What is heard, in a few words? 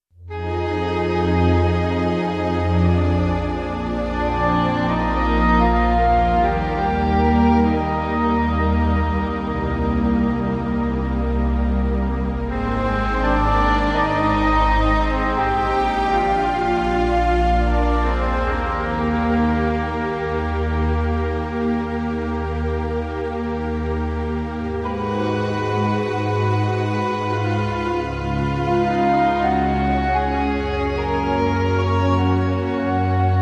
No Piano Just Orchestra